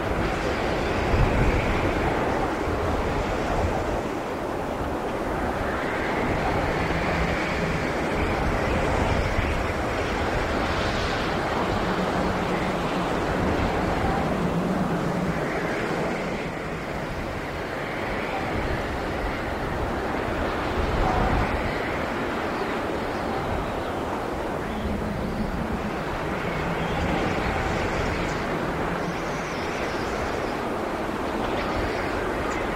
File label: Ambience Tatooine; Angry Birds Star Wars 2